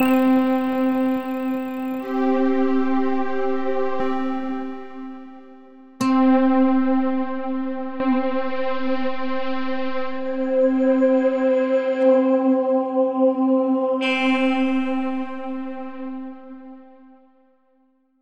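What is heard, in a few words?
synth effect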